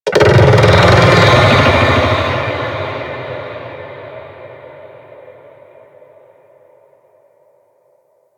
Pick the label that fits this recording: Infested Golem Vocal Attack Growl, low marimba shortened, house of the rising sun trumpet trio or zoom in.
Infested Golem Vocal Attack Growl